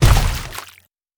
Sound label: Impact Ground